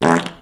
FART

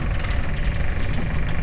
GATE LOOP

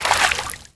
SWIM LITTLE WAVES

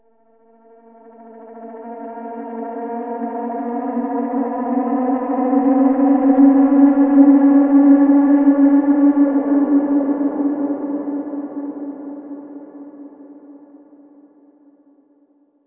ambient crawler scream

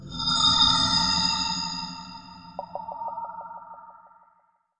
TELEKINESIS CAST; mahlendur

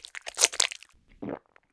SLURP; mahlendur

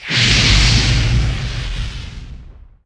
firestorm cast

